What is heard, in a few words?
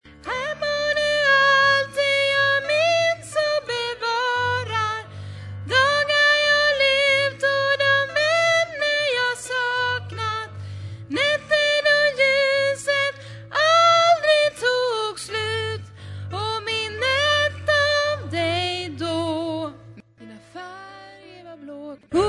tenor